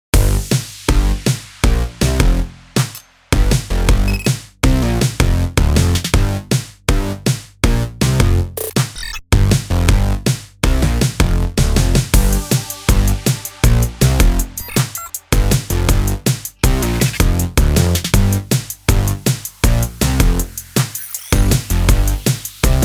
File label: 8bit